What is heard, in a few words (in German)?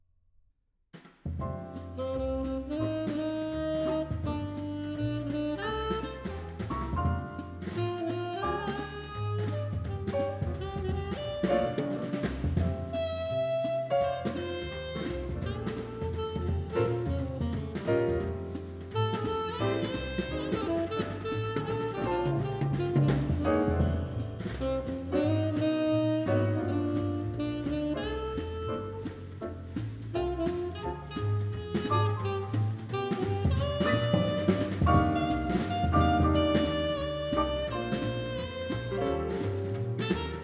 Neumann Tlm 170 Breite Niere, microphone; Das Mikrofon, Vol. 2; The History of the Condenser Microphone